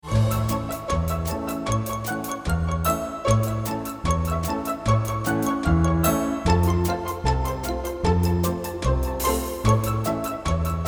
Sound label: pizz